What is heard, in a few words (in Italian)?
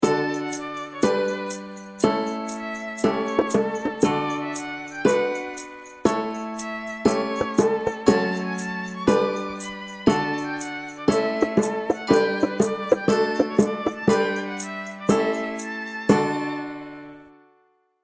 Inno Liceo Musicale mix